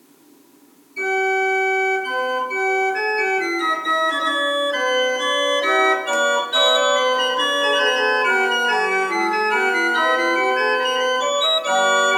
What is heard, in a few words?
Seifert, pipe organ